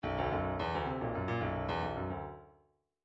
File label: bdf midi file grooves funk e funk e